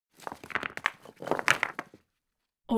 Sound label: rocks knock against each other